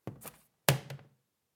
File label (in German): Muelleimer